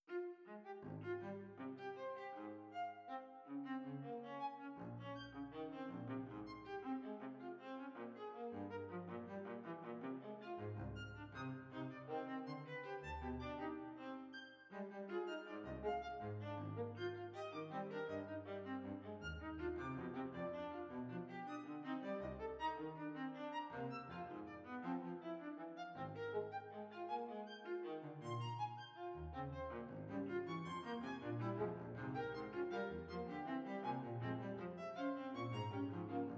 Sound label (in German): fuga als streichquartett, additional viola 20 prozent tiefer; bwv-543; vienna transformed